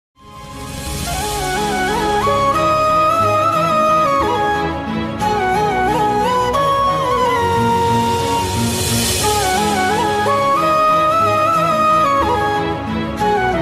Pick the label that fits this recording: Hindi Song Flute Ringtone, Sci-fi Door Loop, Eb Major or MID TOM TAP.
Hindi Song Flute Ringtone